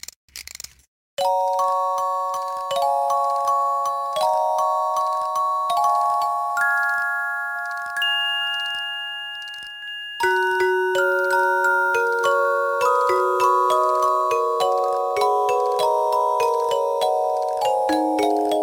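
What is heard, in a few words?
music box; yoshis island ds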